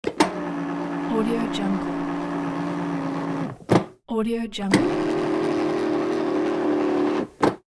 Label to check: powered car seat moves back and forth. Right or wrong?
Right